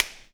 Clap n Snap, Snares

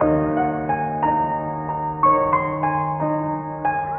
piano trudging on, sad; Loops

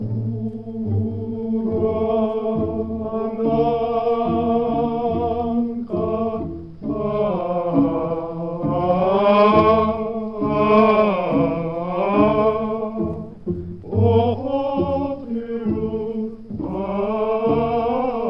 scary music

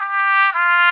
horns two notes down

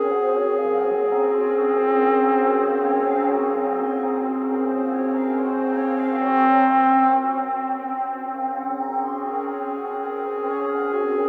keys, Sad

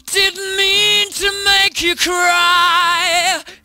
didn't mean to make you cry SUNG

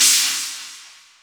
Cymbals, Electro

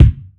Kicks Loud

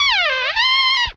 turmpeted duo, Stabs

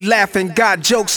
laughing

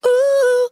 "ooh ooh" SUNG; Voice

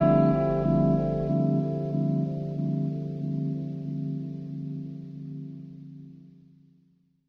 vibraphonic, Synths; Notes

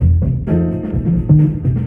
piano and bass; loops; Phonky

